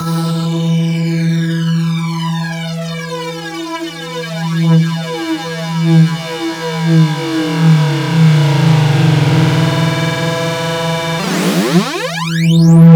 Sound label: Sweeps Long